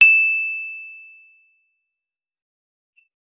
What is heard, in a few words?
guitar electronic, clean electric guitar